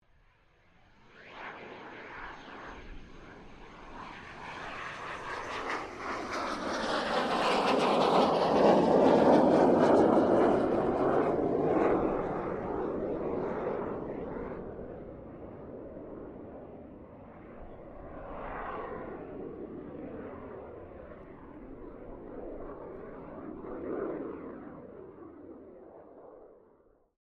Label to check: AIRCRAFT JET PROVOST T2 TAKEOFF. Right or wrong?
Right